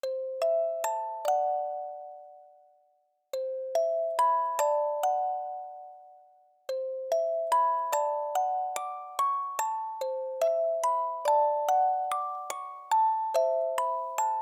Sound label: Music Box